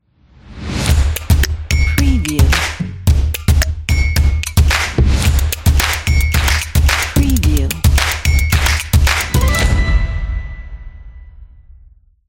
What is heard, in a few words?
urban and energy stomps claps logo